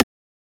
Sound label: Transient; FLPRO